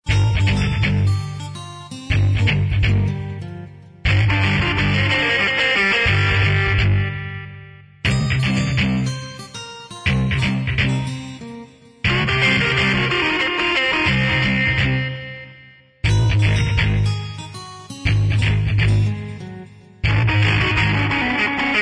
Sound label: Groove clips; keyfax